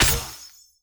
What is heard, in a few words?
Enemy Attack Basic Hit; bloom minigame